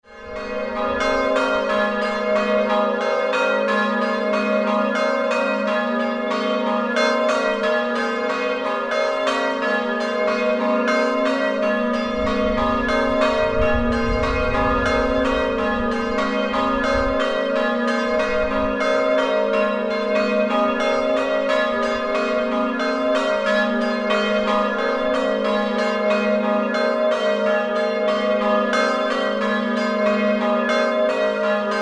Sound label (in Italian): Campane; Coccau